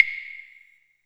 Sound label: Claves